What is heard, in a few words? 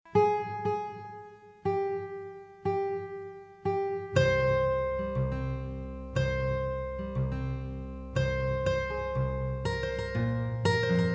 Guitar